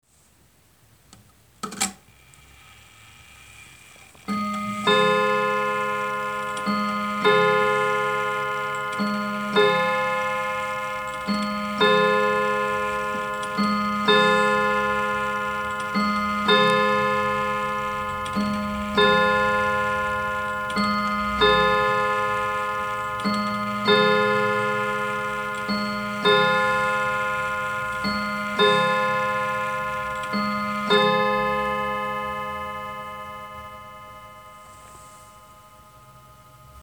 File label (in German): Art Deco Uhr Klangstaebe